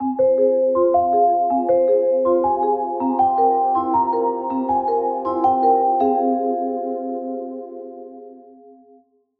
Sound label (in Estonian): kurb kell; Laulupäev